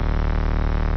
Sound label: industrial hum